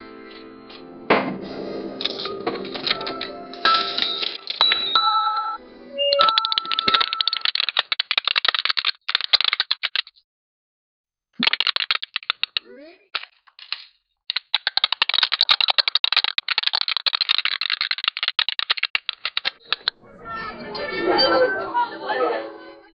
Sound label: quick shots of a phone; mobile game